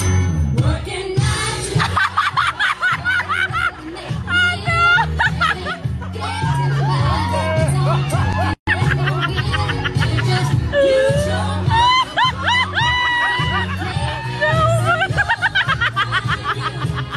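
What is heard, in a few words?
shaking balloons; drag queeen; audio meme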